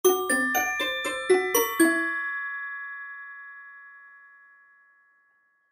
Accent Pizzicato Strings Glockenspiel Melody Whimsical; Musical; Blastwave